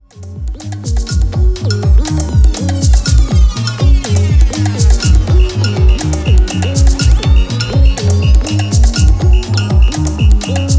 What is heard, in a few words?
Underwater